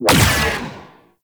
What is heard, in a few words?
Mega Beam Fire; Warframe; Venus; Venus Flying Spaceman